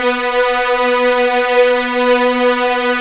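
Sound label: B3 legato forte, violins; East West; The Ultimate String Collection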